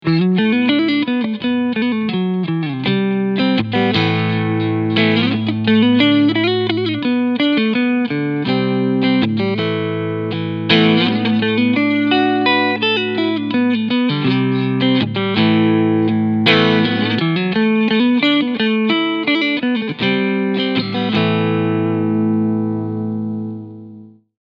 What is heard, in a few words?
Singlecoil Front Clean